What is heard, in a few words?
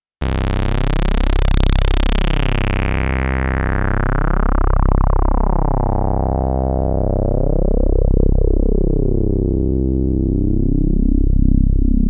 VCF in mid reso, synthesizer